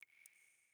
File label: water drip light; Rust